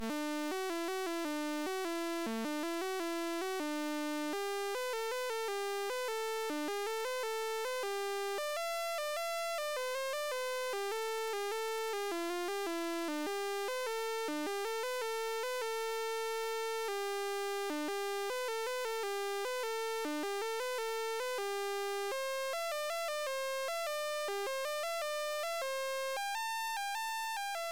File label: Bad C64 Sound; stay a while